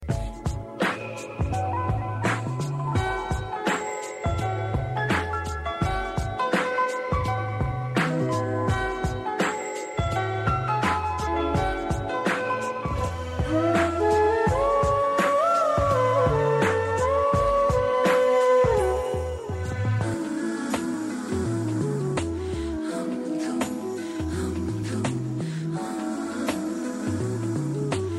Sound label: romantic piano